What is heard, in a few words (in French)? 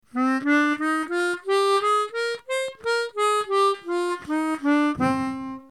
gamme C nat